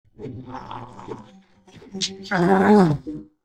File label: Attack; brainrot; brr brr patapim